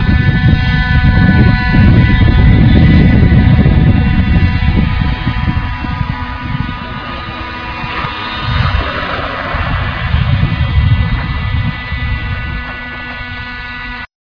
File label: spaceshuttle landing